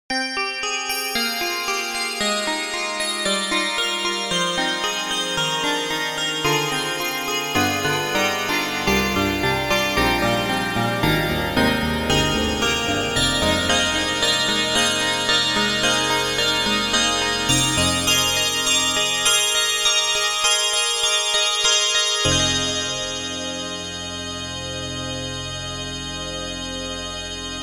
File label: Glass Piano; Cakewalk; Rapture Expansion Pack Biolabs Vol.1